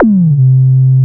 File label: DRONE ZAP; Back In Time Records; Big Bang